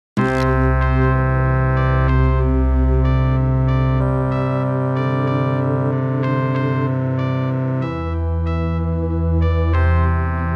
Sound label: six eight